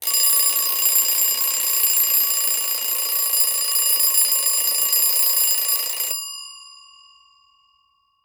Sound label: Alarm Clock Bells; Clocks, Watches and Timers; Blastwave